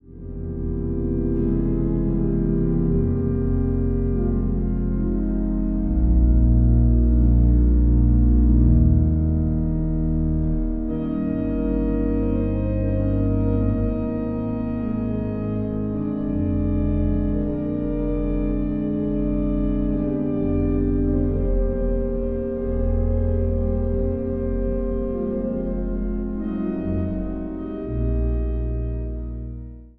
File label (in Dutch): orgelsolo